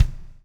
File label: BLUES, Backbeat; Spectrasonics - Backbeat